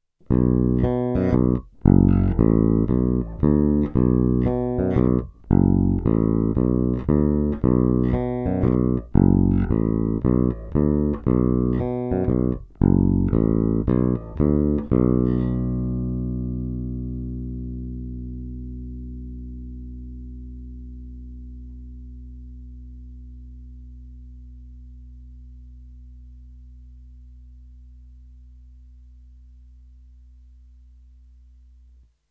Fender Blacktop Precision Bass, Snímač u kobylky SC

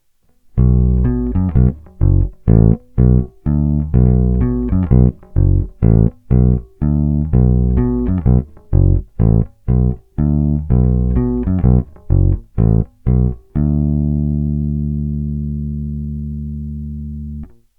Thomastik - DiMarzio snímač, Struny Thomastik-Infeld jazz flats, tónová clona otevřená - cca 50% - tónová clona zavřená; DiMArzio Model P; Téma: Jay Turser Precision bass JTB-400C-BK-A-U

